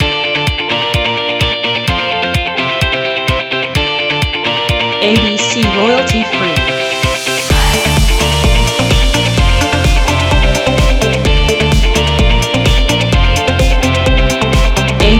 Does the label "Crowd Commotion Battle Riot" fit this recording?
No